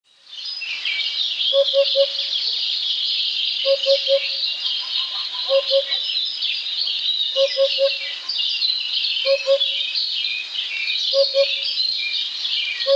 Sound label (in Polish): dudek